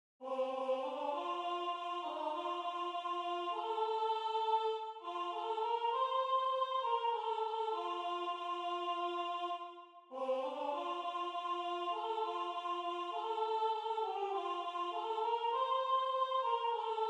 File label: Soprano